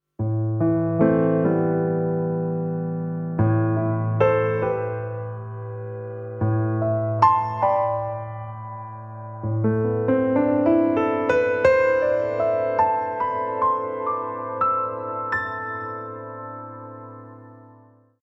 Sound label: Piano Gated; DRP-20